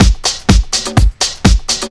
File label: drum loop 125, vocal house; PS-28 Vocal House Samples; drumloops